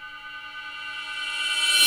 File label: REV Cup, Roland JV-2080